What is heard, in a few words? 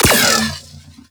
Shot, Weapons, Sentinel; Warframe; Verglas